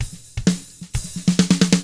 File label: HRDRK, hard rock drums